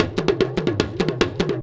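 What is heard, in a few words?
big tama groove; Best Service; World Colours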